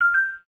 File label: Contact Alert; LG TU500; AIM; IMTone